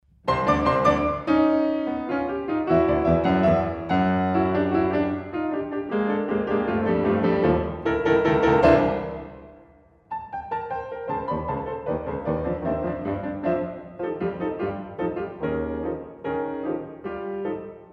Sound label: four intervals